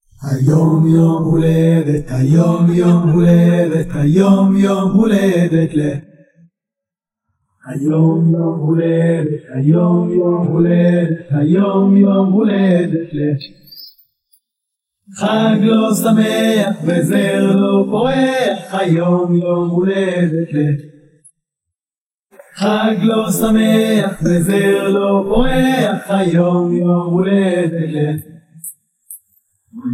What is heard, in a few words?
vocals